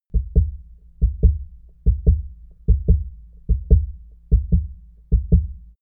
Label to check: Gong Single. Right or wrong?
Wrong